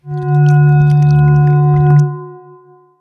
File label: Mega Beast Core Egg Appear